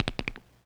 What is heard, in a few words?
Teeth Clacking; Vintage Cartoon; Foley